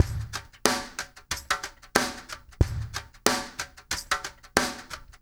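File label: lofi drum left; Spectrasonics; Liquid Grooves 1 & 2; CAVERN SW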